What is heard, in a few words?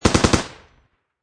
Machine Gun M60 Short Burst Close Perspective